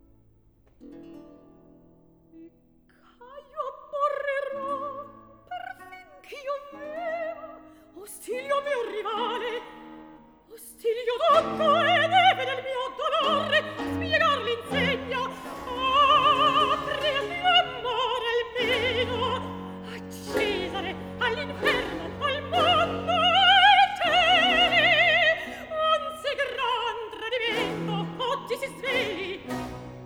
Recitativo; Atto I Scena 11; Caio; Antonio Vivaldi (1678 - 1741); Vivaldi - Ottone in villa (2010)

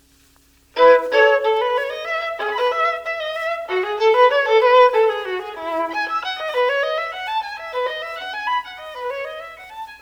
Violin Partita In E - Bourrée; J.S Bach; BWV 1006

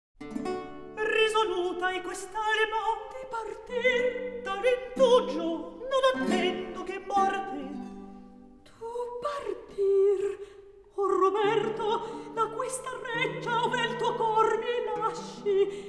Recitativo; Atto III - Scena 1; Roberto, Costanza; Risoluta e quest'alma

